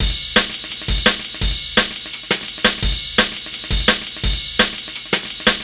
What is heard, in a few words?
Jazz Break, Drum & Bass; Fluid Dynamics